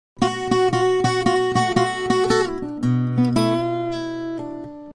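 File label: acoustic guitar, guitar; acgtr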